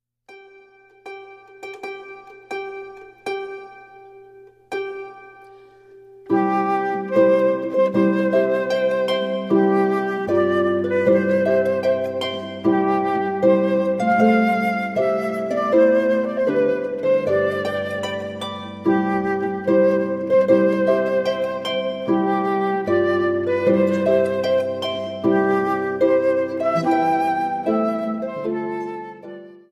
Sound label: Bridal Chorus